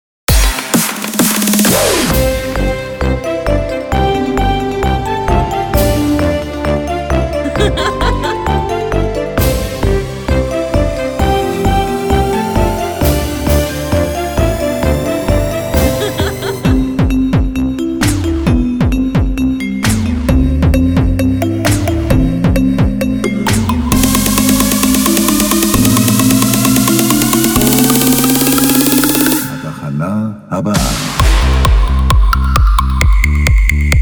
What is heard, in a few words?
concert dance